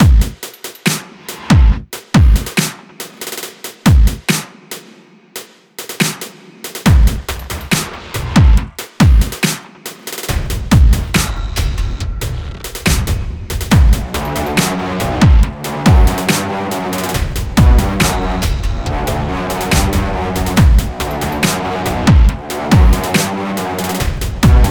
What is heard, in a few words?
Militant Advances Orchestral